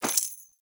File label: Crate Coin Drop; Objects; Pickups; Neathuns